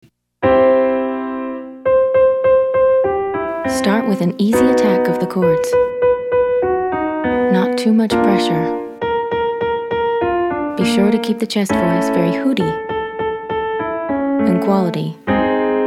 Exercise 2 your turn males

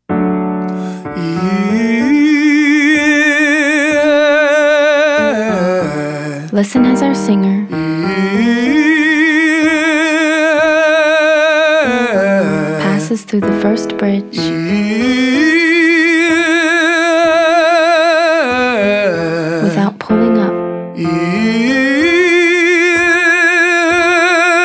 Exercise ee to eh male; Singing

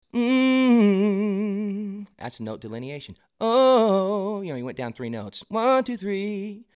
Trills note delineation trill down

